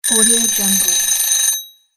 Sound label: alarm clock